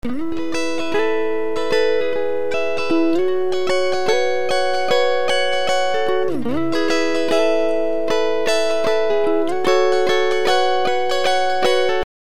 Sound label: Pitch Shift dim fifth